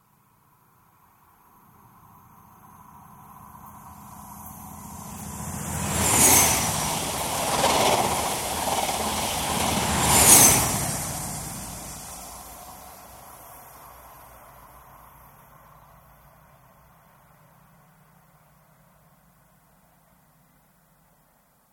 Inter City 125, high speed pass right to left; Trains; BBC

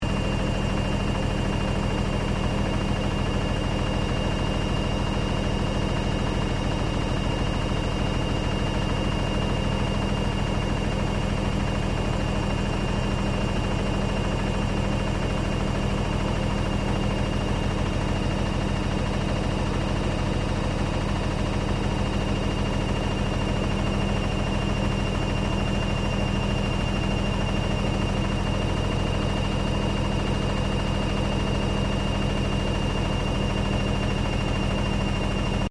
Washing Machine LG Frontloader Spin Medium Loop

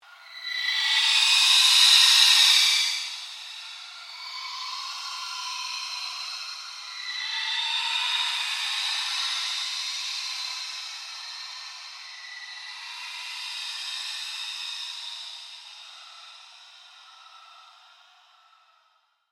Ambient Drone Ghost Chatter Scream

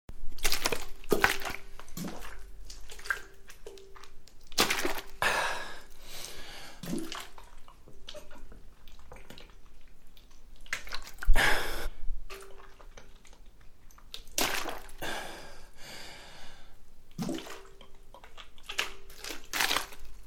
Man drinking from plastic Water bottle; Drinking (Sound Effects:Human)

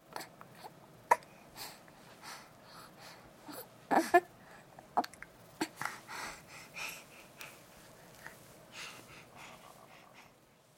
Baby 6 Month Old Breaths Struggles Light; Yawn; Babies

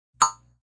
Clink, Metallic; Metallic Sound Effects

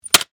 Shutters Close, Window, Slide, Squeak; Household; Window Sound Effects; BlastWave

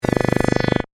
Laser Scan; BlastWave

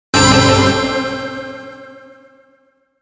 Logo High Metallic Shimmer Bursts; Sonic Logo; 5.1 STEREO MIX; Blastwave

